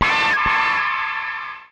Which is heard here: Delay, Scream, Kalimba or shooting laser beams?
Scream